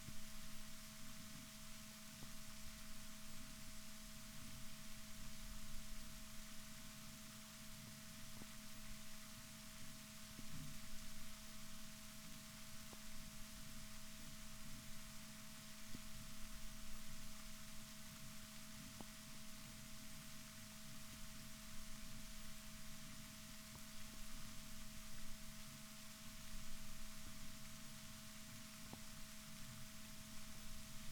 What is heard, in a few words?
rorquals negatif, Balaenoptera Physalus Chile